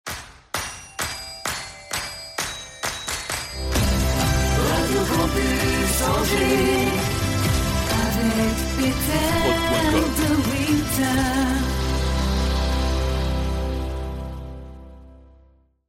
Jingle Chante

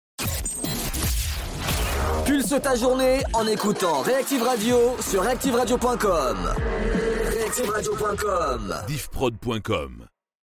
Radio Jingle Pulse ta journee; Reactiv

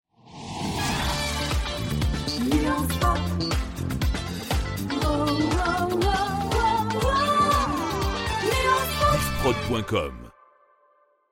Jingle Chante Pop; Evenement Nuances

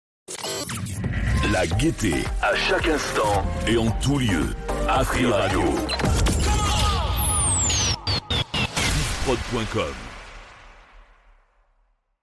Sweep La gaite; AfriRadio

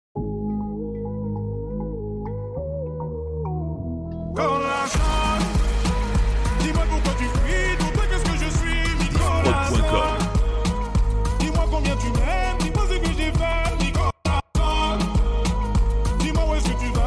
Medley Rap Hits

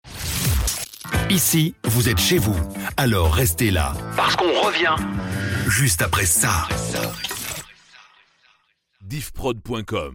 Jingle Pub; Deccro

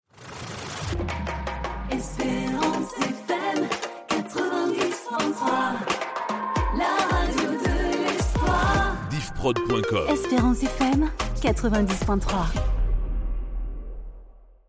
Jingle Chante; Esperance FM

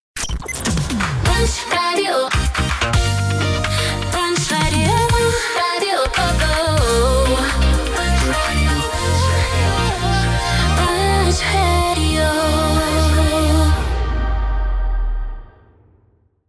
Radio Jingle Chante; Punch

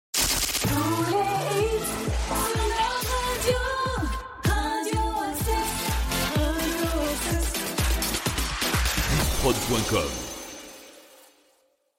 Radio Jingle Chante; Radio Access

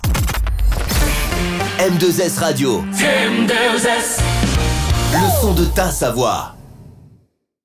Jingle Chante; M2S

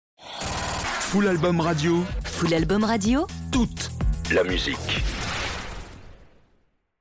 Radio Sweep Toute la musique

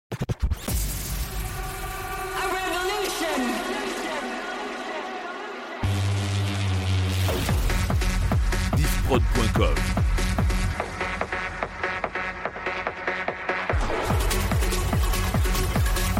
Medley EDM 150 130 bpm

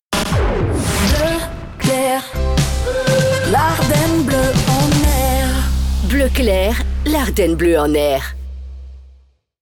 Jingle Chante Theme; BleuClair

